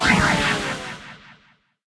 alchemic fail

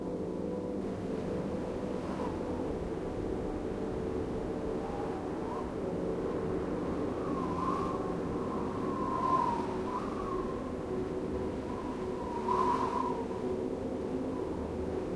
canyon, snow

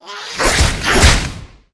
snake attack, monster

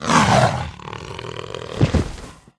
werewolf dead

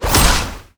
crab atk, monster

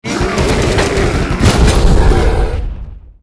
mech bear dead, monster

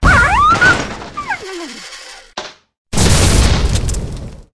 hounds dead, monster

